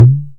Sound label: DH, Indian Percussion Series Tabla; Mango Loops